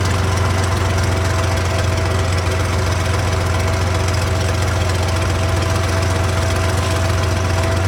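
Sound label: Plane P40 Interior Taxi Loop; Airplanes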